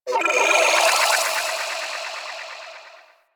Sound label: Ship Data Transfer Pulse; Ambience; Warframe; Corpus Ship Remaster